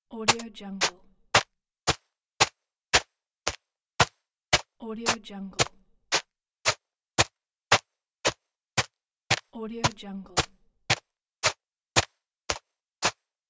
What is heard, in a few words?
walk with sneakers on the lawn